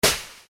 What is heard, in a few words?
household bean bag kick; Living Room; Bean Bag Being Kicked; McKinneySound